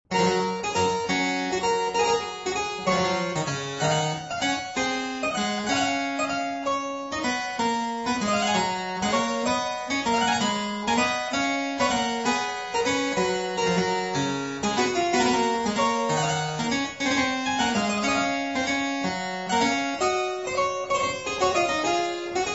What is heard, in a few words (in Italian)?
a 1 ovvero 2 Clav. Al tempo di G; Variatio 7